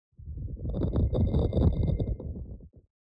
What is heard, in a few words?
Sliding Stone